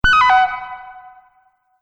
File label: Multimedia Event Notification, beep sound effect